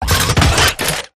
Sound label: gunstand deploy; gow; turret judgement